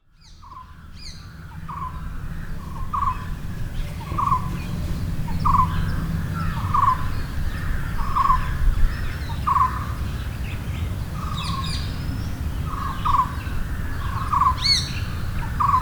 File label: Birds at Cubbon Park Bangalore; Environments; Samuel Corwin; Selected Field Recordings from India and Nepal Volume III